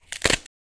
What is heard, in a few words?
crunch; sorcery